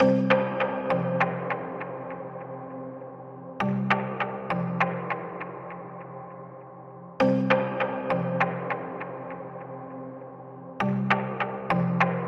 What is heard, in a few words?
No Percussion